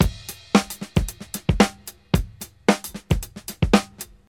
113 bpm fresh drum beat A key, kick tuned to the A note